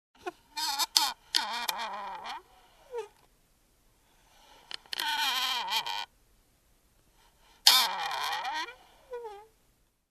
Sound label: Small drawer squeak